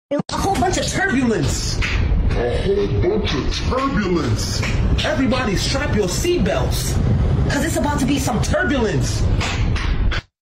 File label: turbulence; Meme